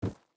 jump

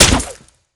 Weapons Arrow Shot

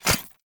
step

